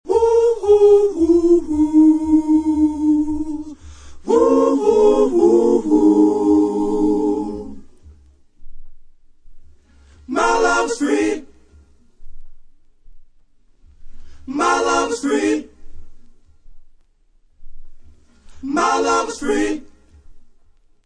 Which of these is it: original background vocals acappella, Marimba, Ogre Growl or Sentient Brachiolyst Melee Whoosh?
original background vocals acappella